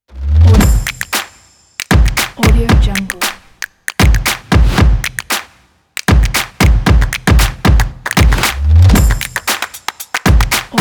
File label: percussion